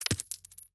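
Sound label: ui gold obtainment; Shelter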